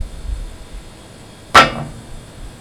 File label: Thunk guardrail; fieldrecordings